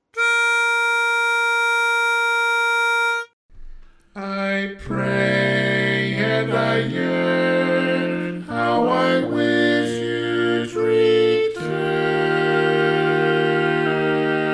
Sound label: Lead, barbershop tags